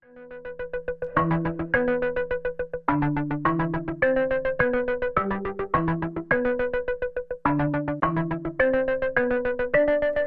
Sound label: Mallets and Pulses Only